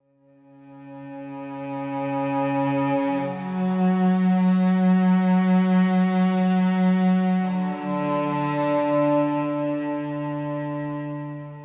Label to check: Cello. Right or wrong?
Right